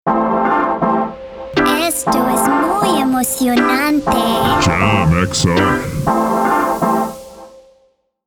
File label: Radio Stinger